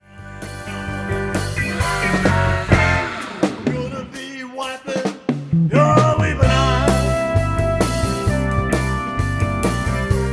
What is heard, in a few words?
trumpets keys